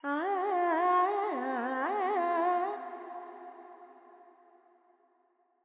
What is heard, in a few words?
Pentatonic, Vocals, Carnatic Female, Alaps, G